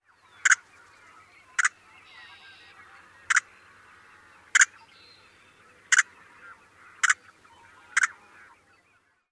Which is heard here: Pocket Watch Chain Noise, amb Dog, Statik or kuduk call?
kuduk call